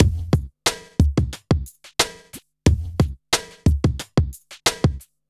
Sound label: Smooth, R&B Drums